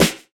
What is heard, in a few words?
SNARE; E-Lab; X Static Goldmine 2; akai